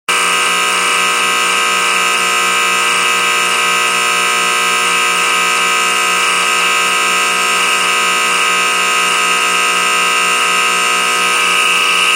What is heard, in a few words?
Chiller Alarm